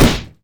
Wingbox War Damage

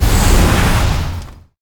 Point Damage, bullet

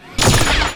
Guard Attack; Mob

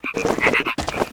VOLCANIC DEMOLIS WAR ATTACK

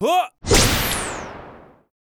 attack; Miler; Mob

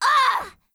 female damage critical

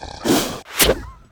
Elder war attack

